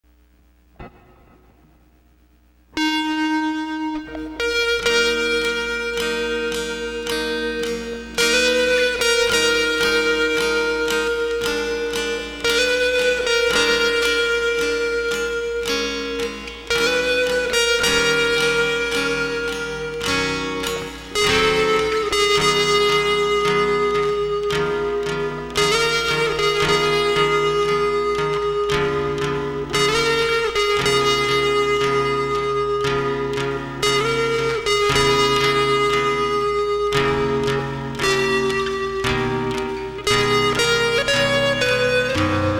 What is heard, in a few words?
Chopin Prelude No4 for 2 guitars